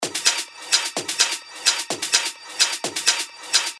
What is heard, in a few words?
128 BPM; Necropolis